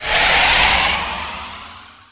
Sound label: snared off